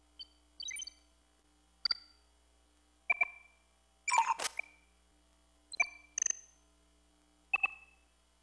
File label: command hum; Tribes2